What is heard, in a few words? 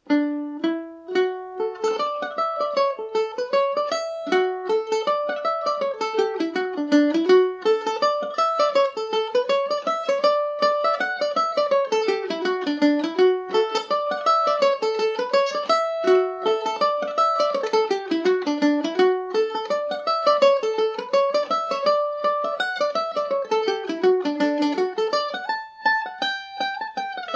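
D major, irish mandolin; The Torn Jacket; G&O #34 mandolin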